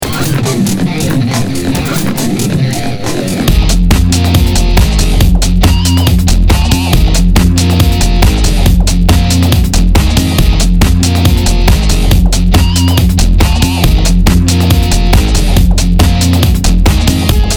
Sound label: rock